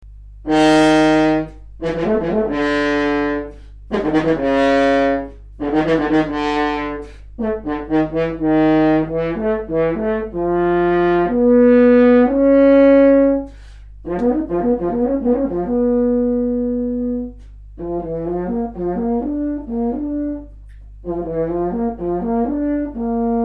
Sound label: horn